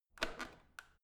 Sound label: washing machine creaky rotary button quick; haus garten; haushalt